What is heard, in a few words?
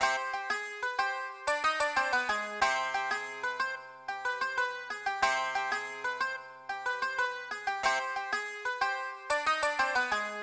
Tzouras, 092 Cmin